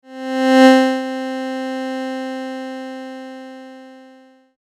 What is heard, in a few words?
adsr